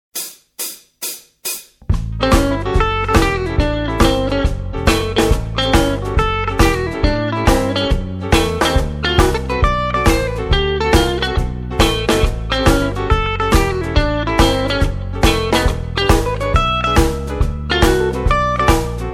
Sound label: blues jazz